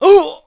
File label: death; mohaa; dialogue